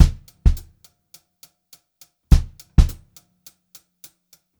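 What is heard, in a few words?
104 straight variation, Funk Groove Drumloops; USB Soundscan vol.38; AKAI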